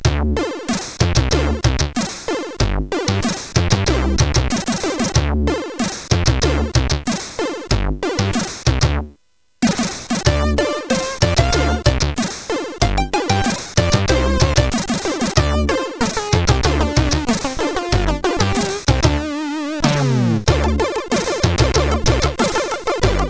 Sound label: jazz vibe, CSG8580R5